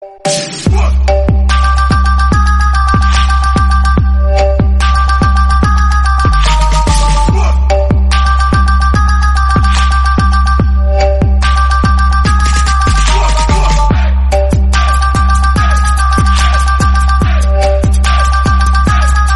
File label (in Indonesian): Nada Dering iPhone